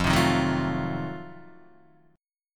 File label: F 7th Flat 9th, Guitar Open D